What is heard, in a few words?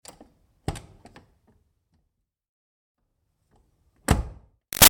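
Truck Ford Pickup Door Open Close; Trucks; Vehicles; Big Room Sound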